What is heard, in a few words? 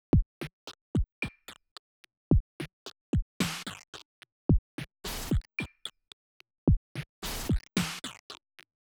drum loop digi destruct phase